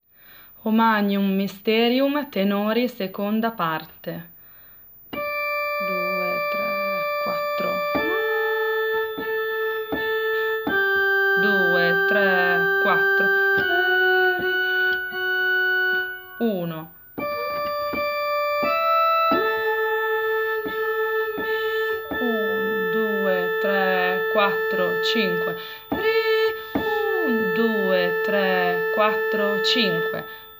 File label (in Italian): O Magnum Mysterium tenori